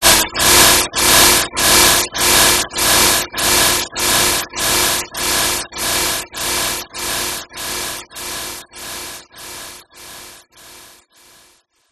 sound design frequency modulation long fading noisy burst